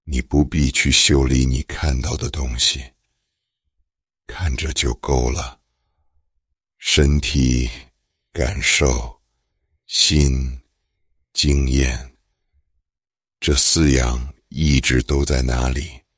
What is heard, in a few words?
drop, remix